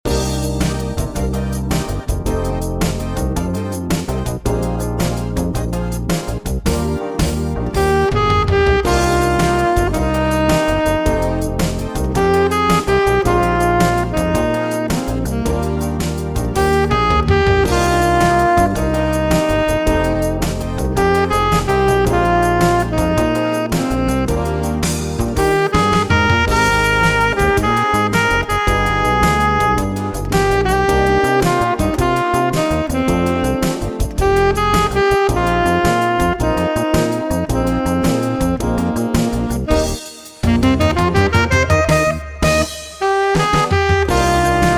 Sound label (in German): mit sax